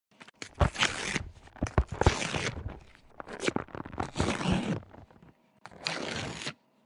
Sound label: frustrated paper rips; Foley